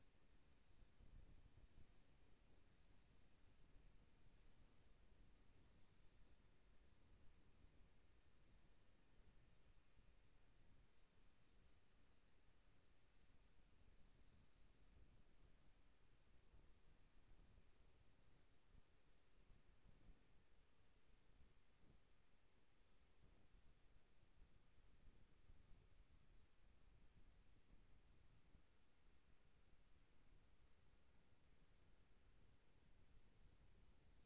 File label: HYDROPHONE 16000Hz; JASCO AMAR HYDROPHONE 740